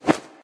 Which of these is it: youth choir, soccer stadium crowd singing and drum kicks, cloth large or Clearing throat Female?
cloth large